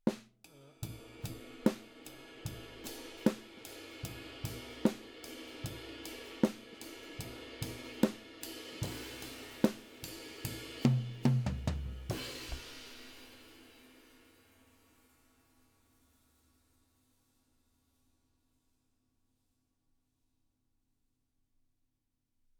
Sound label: Drums